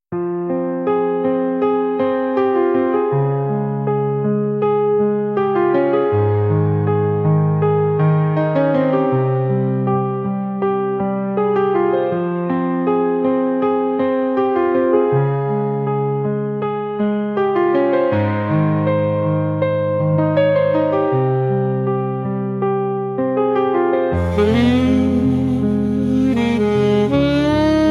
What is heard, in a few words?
midnight sax